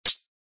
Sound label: Hi wonder hat; drums; hip hop; STYLE KITS (Hip Hop, Etc); Khezie Hip Hop Kit